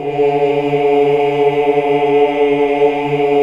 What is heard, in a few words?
OOH, Hallelujah